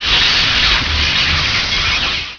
worm sign elec; Emperor - Battle for Dune

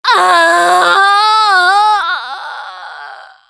Death Scream

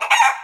chicken panic; ambient; creatures; Counter-Strike Global Offensive

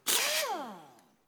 wrench; AssaultCube; Alien Stars; American Muscle Series

